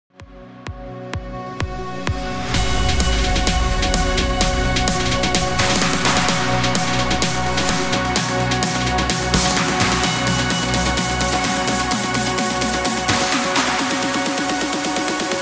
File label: PROGRESSIVE 128 BPM